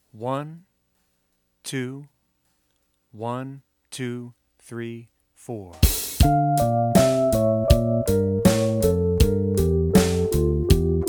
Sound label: D Minor Etude